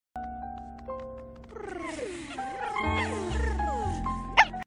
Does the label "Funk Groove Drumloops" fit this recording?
No